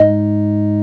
organ G#, M1 organ; Best Service ProSamples vol.10; House; AKAI

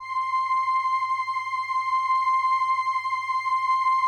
LAMBDA, String Machines

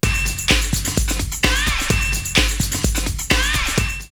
Rear Groove 128, drumloops, Rave; X-Static Goldmine; 90 s Sample CDs

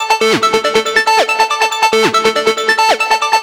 NRG Arp, trance; Sonic Foundry (Sony Creative Software); Trance NRG VOL-1

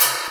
SPRINGS, Percussion; InVision Interactive; Percussion Slam

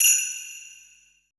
SHELLS, PERC; BPC PERC

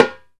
hi timbale

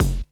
KIT, Dance Mega Drums, HIP; Best Service

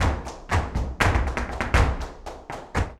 Flamenco, Tapping, Bulerias, 121

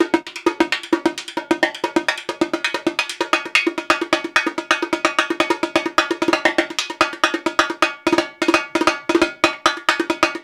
130 bpm, Rio batucada

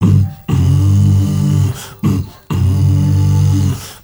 MALE HUM, M. HUMMERS

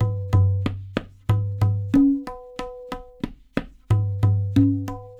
LOOPS, Percussionworks; Sampleheads; NYC Percussionworks; 90 s Sample CDs

